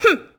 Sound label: Boxing Female Punch Grunt